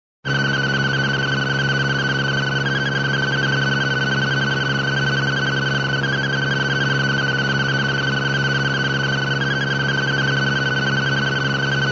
sci fi space capsule in flight; cartoon; jetsons

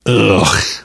reaction disgusted, survivor voice; source engine; left 4 dead 2; namvet

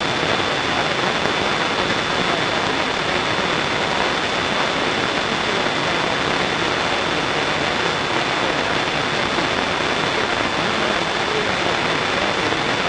dinosaur noise; video games; source engine; portal2

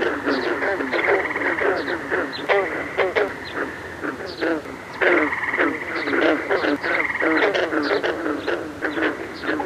animal call frog loop; Source Engine; tf; lair

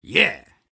positive vocalization; soldier

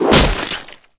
arrow impact flesh

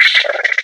box monster chitter

